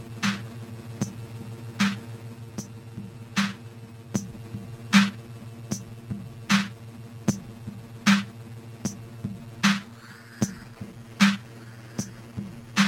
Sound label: Noise